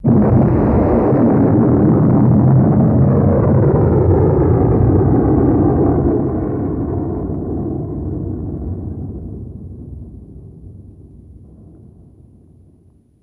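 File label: Missile Impact Explosion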